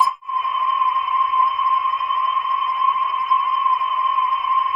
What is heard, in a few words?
sonar pluck, sub aqua synth; Zero-G Ambient 1